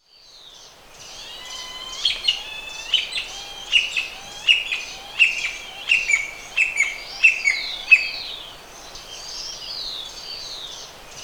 Strong-Billed Woodcreeper (Xiphocolaptes Promeropirhynchus), Andean Birds; S. America